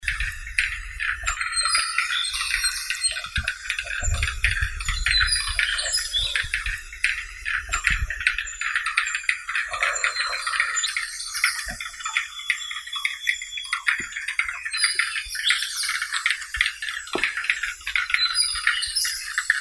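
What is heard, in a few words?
black-billed capercaillie, Tetrao parvirostris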